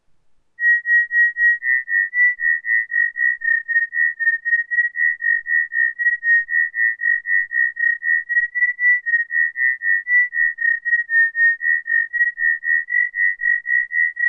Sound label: throb